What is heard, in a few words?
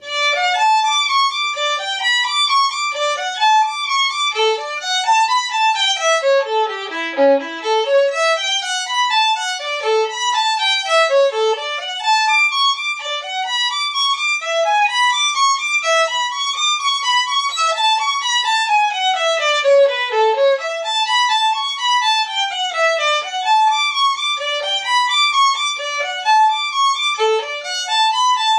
Violin Etude; Franz Wohlfahrt Op45; no 34 from Book 2; grade v